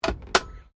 CAR HOLDEN FJ GLOVEBOX CLOSE, Classic Australian cars; 1955; Vehicles; SoundEffects-Foundation1